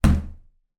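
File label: household door close impact bang wooden; zapsplat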